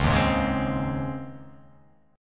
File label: C sharp Major 7th Suspended 2nd; x,4,1,1,1,3,2,x